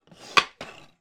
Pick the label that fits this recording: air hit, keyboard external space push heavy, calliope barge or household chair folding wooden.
household chair folding wooden